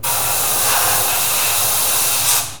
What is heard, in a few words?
Air blower; INDUSTRIAL